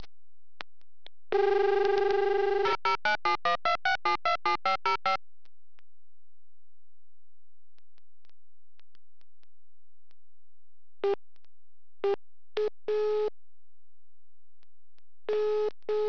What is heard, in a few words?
ring